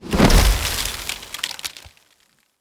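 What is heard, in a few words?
magic destruction cloak frost; skyrim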